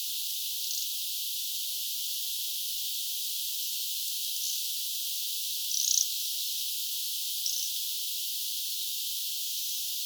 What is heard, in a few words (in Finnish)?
vahan kulorastaslintujen aantelya